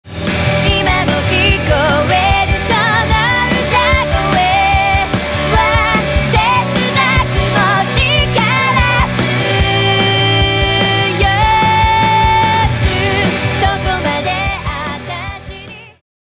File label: Answer Song